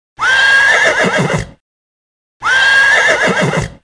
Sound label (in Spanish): sonido caballo